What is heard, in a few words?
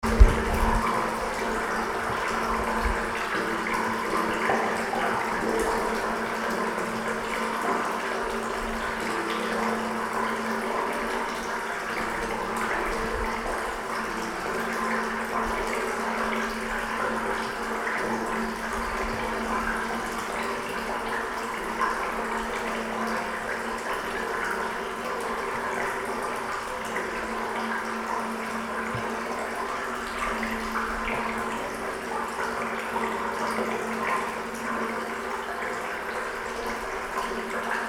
water drips port chalmers